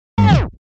stop; virtual dj

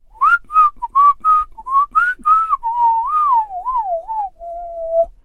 whistling

